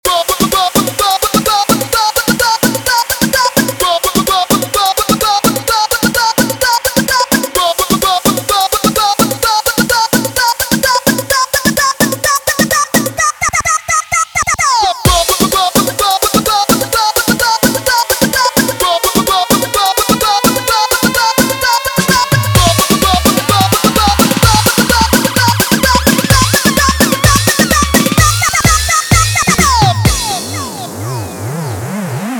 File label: Saxo groove remix 128bpm